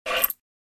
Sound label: Coin picking up from a wooden table